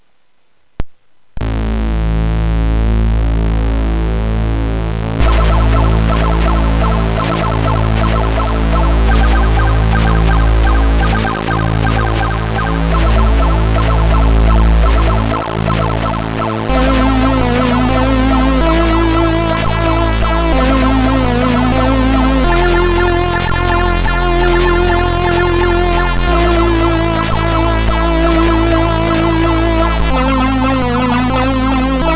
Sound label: sid, MOS6581R4